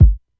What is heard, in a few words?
short kick drum sample g sharp key